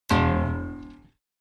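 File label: Grand Piano Strings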